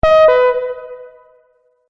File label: Multimedia Event Notification, beep sound effect